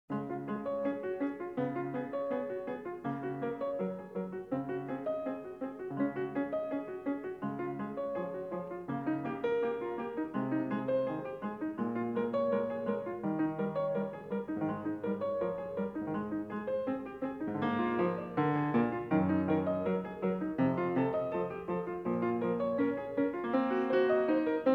Prelude No. 3 in C-sharp major, Bach, Well-Tempered Clavier; BWV872